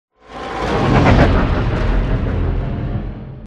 Prop Warehouse Prop Rack Pass By; Titanfall 2; Boomtown

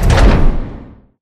crane move stop clunk; Titanfall 2

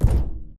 Footstep Jog Far; Titanfall 2

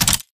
Mvmt FS Walk; AI Tick; Titanfall 2

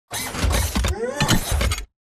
combat run turn; Titanfall 2

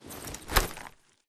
arc tool steal; Beacon; Titanfall 2

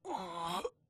wall slide grunt; Titanfall 2

